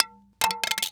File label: Electronic CD Fall To Ground